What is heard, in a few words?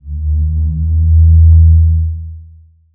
rnd dark, ambient outdoor